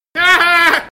Laughing; Nerdcubed

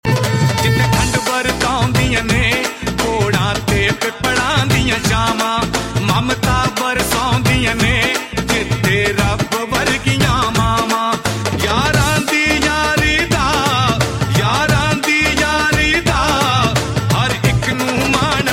punjab tone

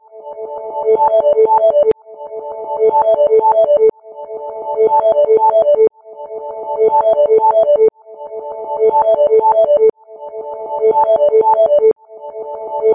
Cool Sweet Tone

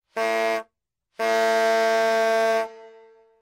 Clown Sounds

